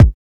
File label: old school reverb kick drum single hit A key